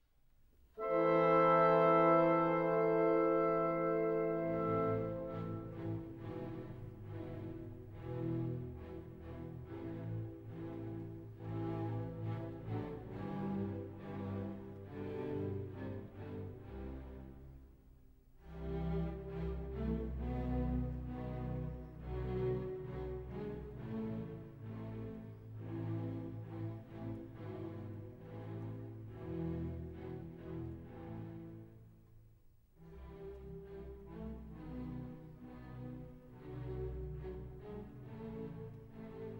Symphony in A major, Allegretto

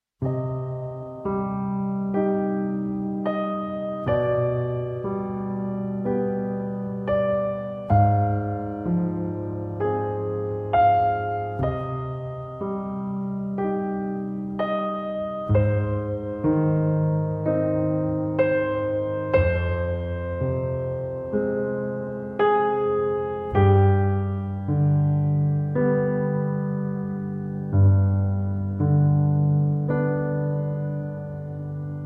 Elegy (Piano & Cello)

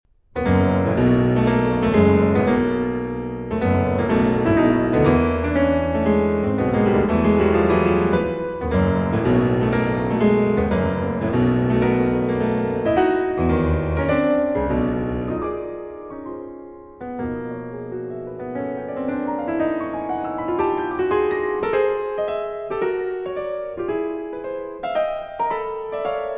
Piano Sonata in E-Flat Minor

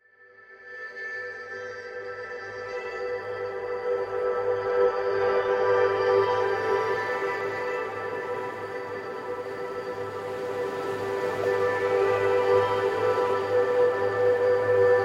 432 Hz Sound Therapy

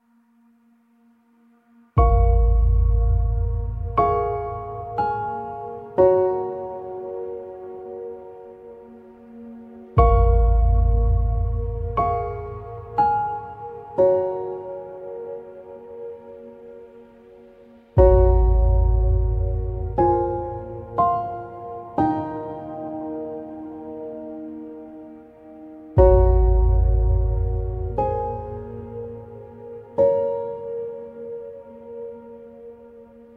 Sad Surrender, Piano

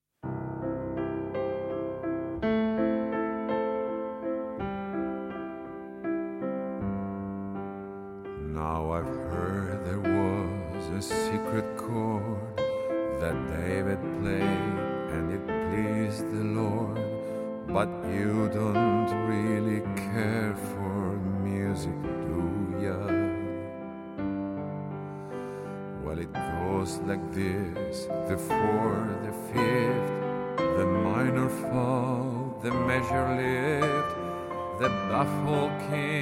Acoustic

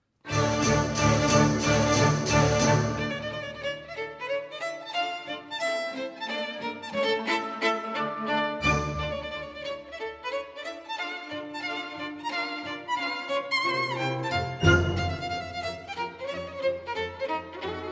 Tarantella. Presto; Concert Suite, Op. 28 V.

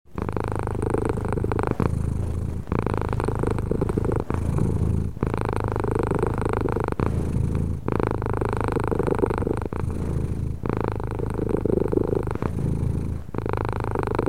Cat Purring Fast & Breathy (Loopable)

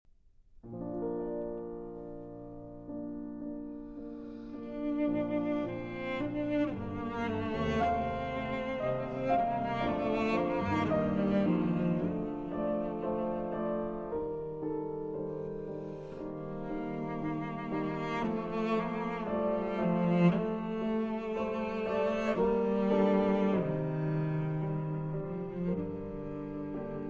Cello and Piano; Sure on This Shining Night, Op. 13 No. 3

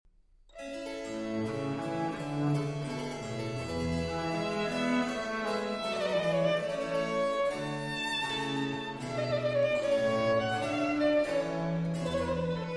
Violin Sonata in D Minor, Andante; Sonata op. 4 no 8; Op. 4 No 8, H. 92

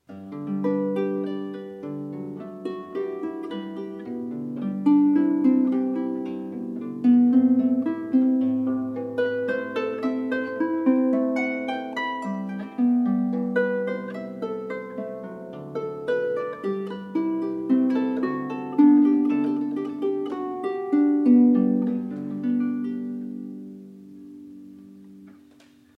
Suite in G Major, Prelude (Arr. For Harp)

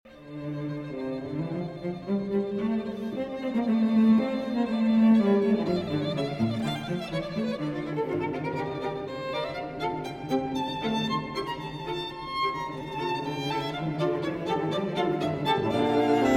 String Quartet No. 1 in F Major; Beethoven; String Quartet No. 7 in F Major, Op. 59 No. 1

